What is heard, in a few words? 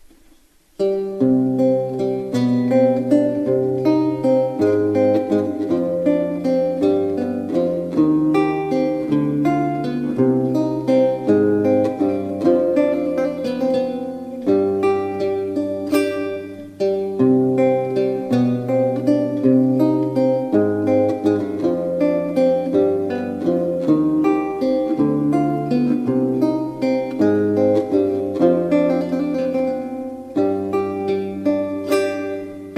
gigue